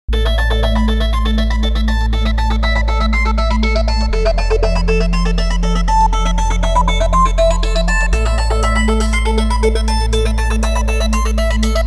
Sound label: News Opening, EDM; 리듬